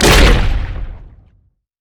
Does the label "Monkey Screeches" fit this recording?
No